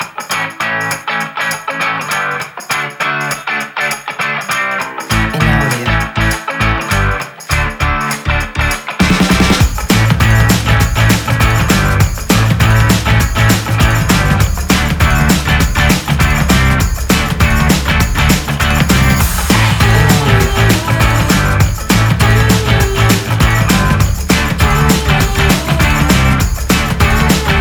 Sport Energetic Rock

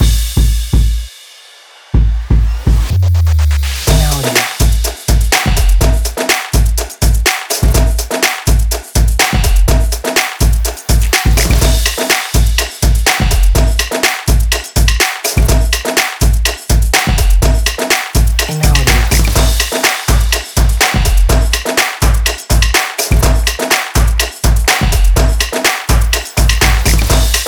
Percussion Energy short version

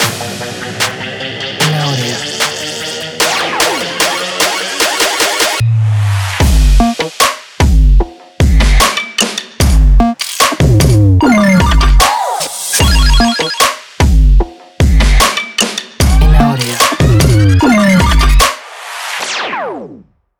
Hit the Beat; Short Version 2

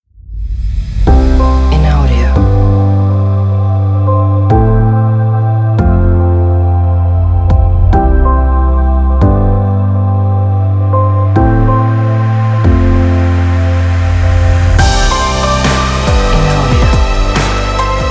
Inspiring Motivational Electronic

